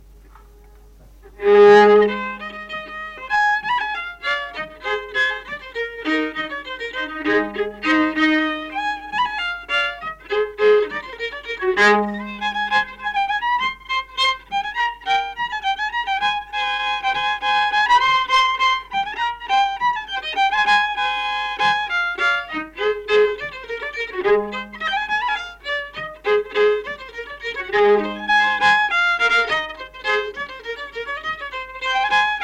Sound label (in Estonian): Gammal brollopsvals Vana pulmavalss viiul folk; Ruhnu